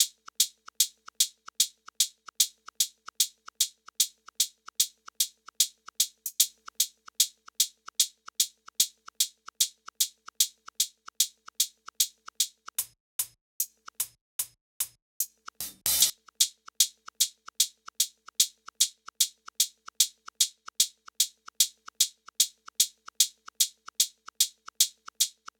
SEISMIC hats 75; Hat Loops; drum sample pack; Cayambe; Slate

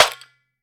snare ring rattle; one shots; SEISMIC Drum Sample Pack; Slate

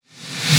snare; Slate; SD MIDNIGHT Sample Pack; wavey

